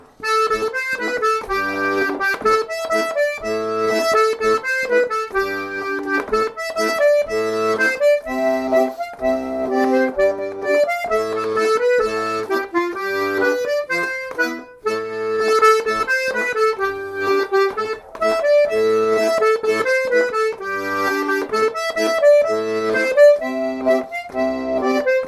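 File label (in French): branle, accordéon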